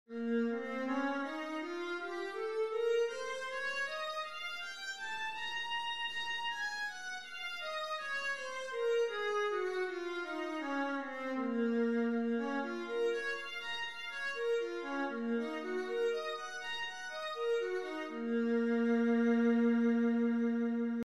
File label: viola b flat minor, scales